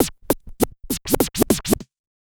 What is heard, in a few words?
Scratch, Trance